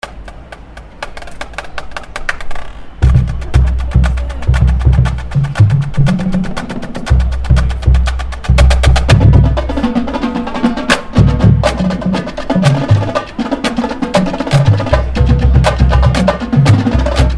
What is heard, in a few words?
Drum Line; DRUM CORP; Cavaliers Drum Line